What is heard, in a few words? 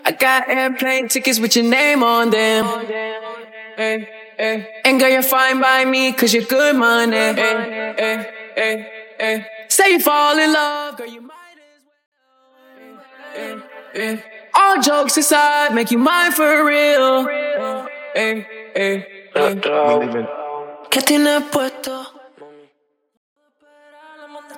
Acapella